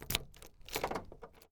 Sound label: car door unlocking